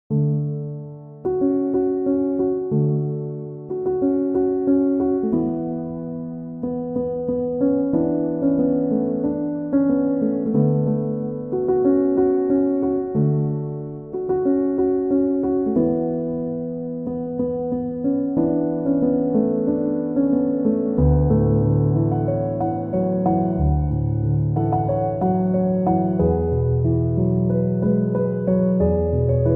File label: chill piano instrumental